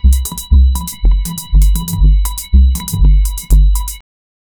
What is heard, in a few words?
slow pulsating electronic; beats